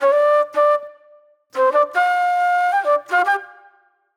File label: Flute, Disco